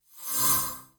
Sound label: Flash